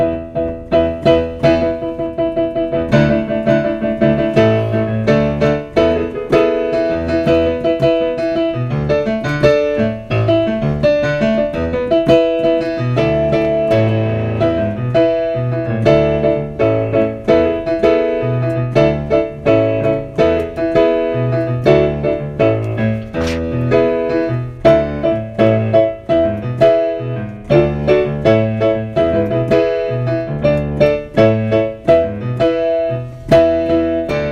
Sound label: accompaniment